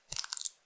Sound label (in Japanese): くちゅ音単発, ASMR